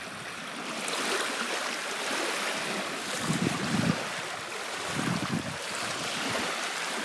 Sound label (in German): Plätschern Starnberger See